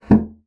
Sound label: bassoon slap; Xsample VOL-6; C BASSOON